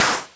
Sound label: Jomox Hat